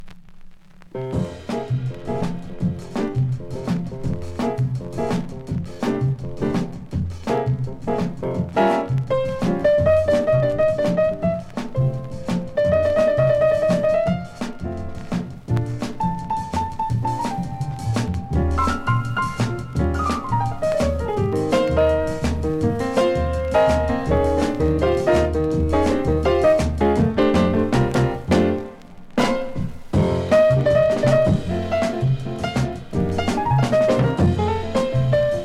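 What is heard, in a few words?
Latin Descarga Guaguanco Jazz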